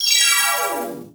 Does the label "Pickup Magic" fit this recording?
Yes